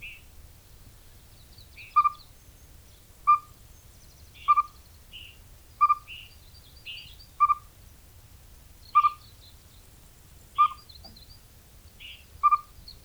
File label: Siberian Chipmunk call, Wuerqihan; Beijing; a guide to the mammals of beijing